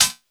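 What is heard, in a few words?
hi-hat, Drum & Bass, 160 BPM; Ueberschall; Drum & Bass Flash Trackz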